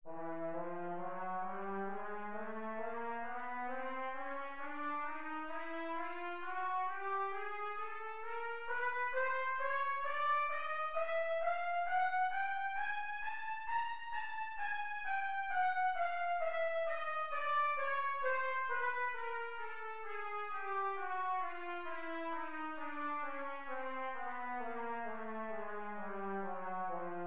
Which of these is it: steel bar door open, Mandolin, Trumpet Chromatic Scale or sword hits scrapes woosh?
Trumpet Chromatic Scale